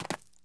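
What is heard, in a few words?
land light, dirt foot; The Elder Scrolls III - Morrowind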